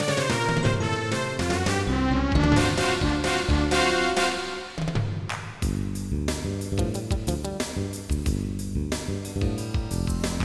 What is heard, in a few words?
rhodes; dime city